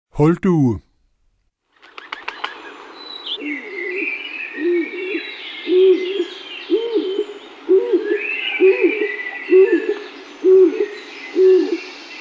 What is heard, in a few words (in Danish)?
Huldue